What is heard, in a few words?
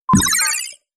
beep; poppy playtime mecha catnap